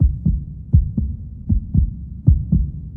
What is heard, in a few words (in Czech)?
srdce; Mafie 1